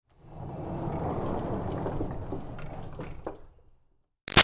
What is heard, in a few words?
Door Barn Rolling Light Roll; Barn Doors